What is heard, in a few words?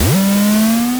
Pitch Bended Thing, Lo Fi Drum